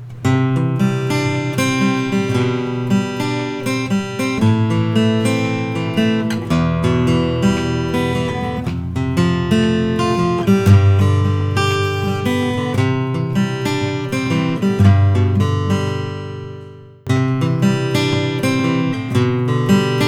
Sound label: preston thompson 000 12ba cutaway